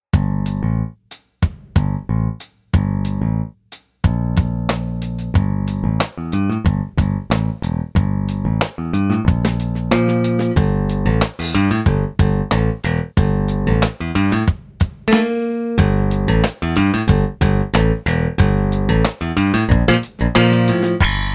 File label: grate bass lite; borth audio